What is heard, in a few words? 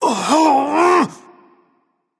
ouch; smite; thor